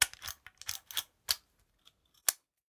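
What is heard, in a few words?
lever action rifle lock and load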